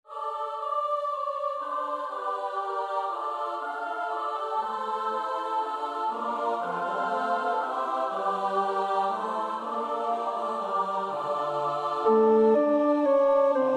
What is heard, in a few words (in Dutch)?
tenor 2; Röntgen (Julius); 6 oude Nederlandsche liederen